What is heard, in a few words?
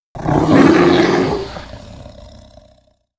Polar Bear Vocal; Animals; XBOX; BassProShopsTrophyHunter2007